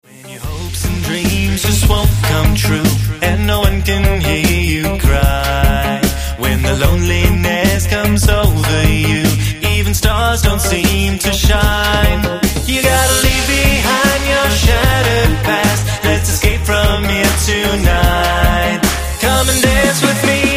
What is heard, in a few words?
Reggae